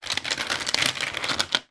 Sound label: household lots of small fridge magnets move and slide on fridge door